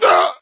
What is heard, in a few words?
high damage death